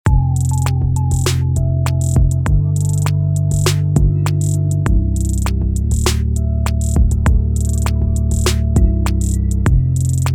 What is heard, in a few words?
loop sad